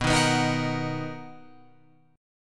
B Major 7th B down Guitar Open F; strummed

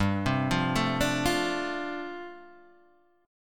G 9th Suspended 4th, Guitar Open D

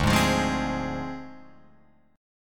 D Minor 7th Flat 5th Guitar Open F; Dm7b5; strummed

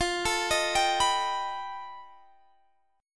F 9th Sharp 11th, chord; F9#11; arpeggiated

